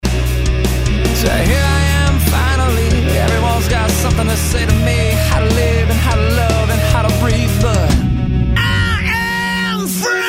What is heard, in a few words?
Strings